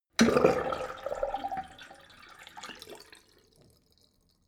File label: sink drain hard short